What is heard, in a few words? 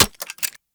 Shot Mech; Warframe; Weapons; Acceltra Prime